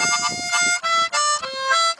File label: Harmonica